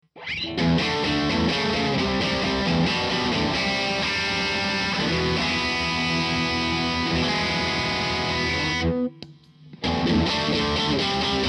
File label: OD Univibe